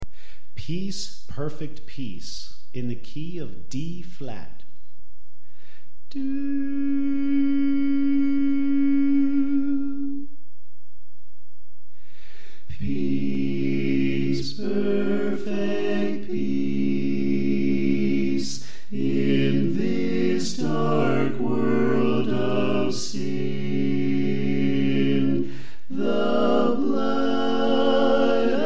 Soprano Left

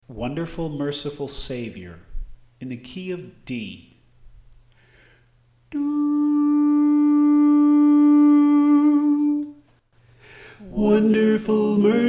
Tenor

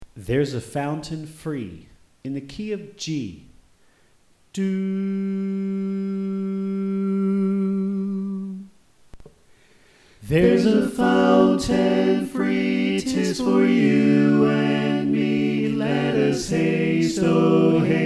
Alto Left

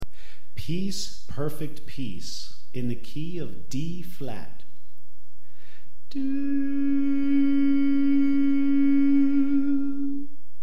Tenor Left

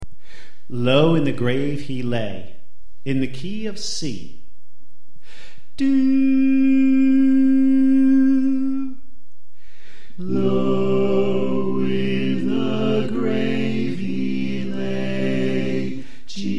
Alto Left

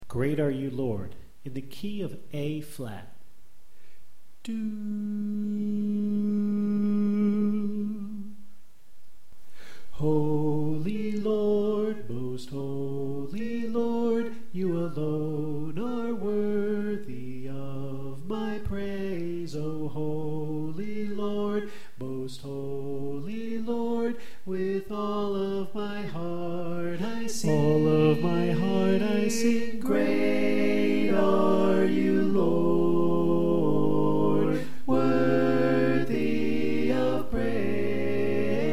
Soprano Left

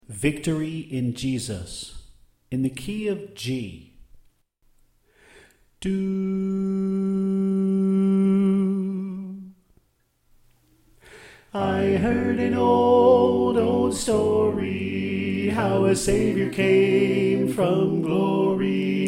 Soprano Left

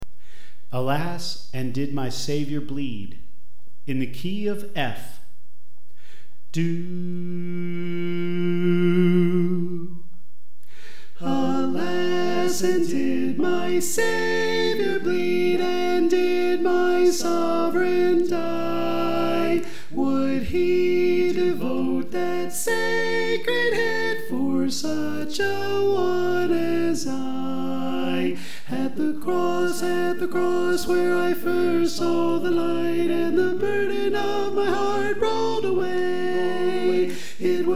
Alto; Alas And Did My Savior Bleed

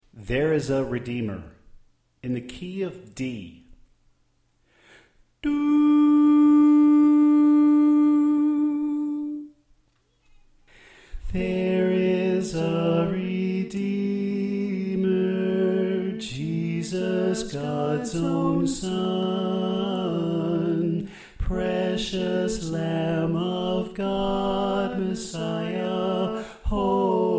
Tenor Left

